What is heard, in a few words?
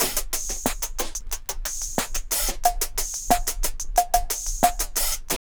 TRIP 90, trip loop